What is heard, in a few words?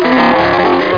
baron die; doom